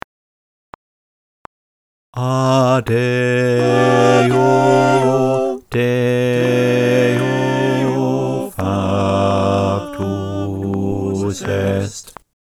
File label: bas click